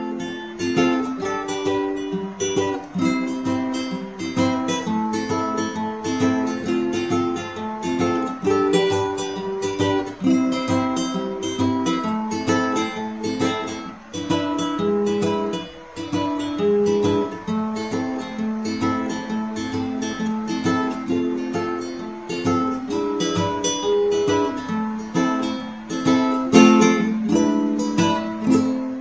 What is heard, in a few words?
ukulele solo